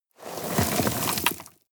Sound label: sweep small rocks